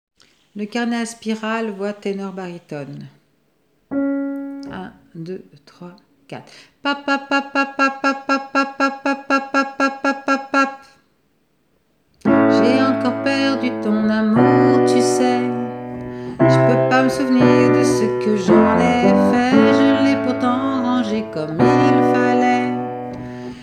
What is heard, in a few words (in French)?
voix tenor barytone; Voix Ténor Barytone soprano et alti première partie